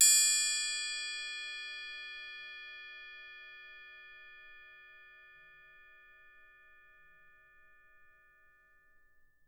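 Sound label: LG TRI right, percussion; Miroslav Vitous - Percussion & Harp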